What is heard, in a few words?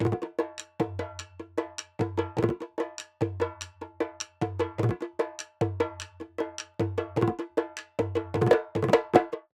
Dhool Loop BPM 100, Assamese folk; Gully Loops; waves of bihu